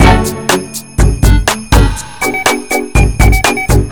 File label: Assembly Loop, music loops; Sonic Foundry; Sony Creative Software; Club Joints and Street Anthems